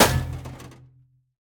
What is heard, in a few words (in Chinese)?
宝库破坏声